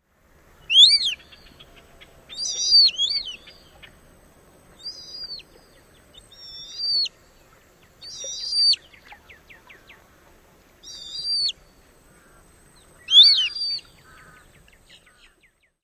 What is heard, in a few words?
immature squeals